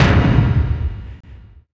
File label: kick, Drum and Bass